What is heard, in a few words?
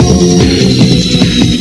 rave loop